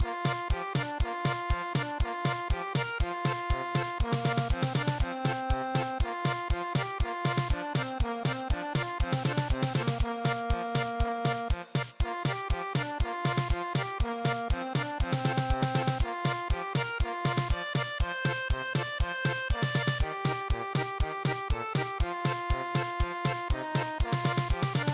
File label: German Polka